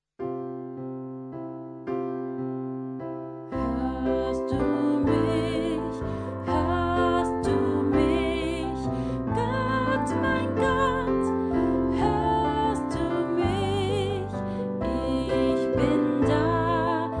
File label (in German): einstimmig; Hoerst du mich